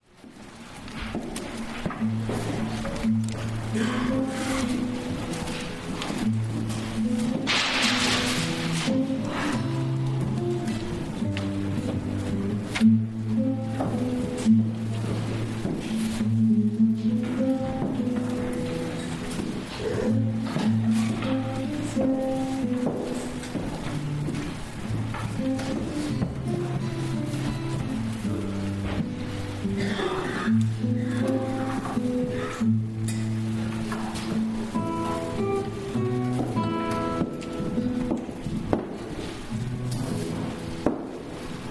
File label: Guitar Solo